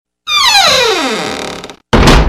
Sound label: sound car door open